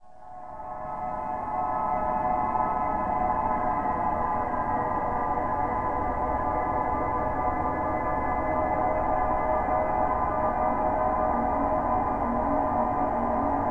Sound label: Amb Drone Metal